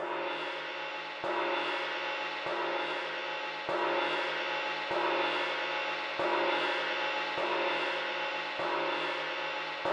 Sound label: Cymbal fast; gamerip